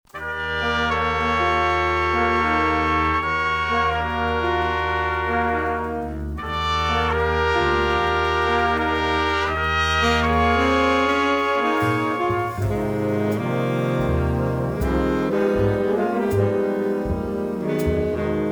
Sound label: Paris Blues alt bed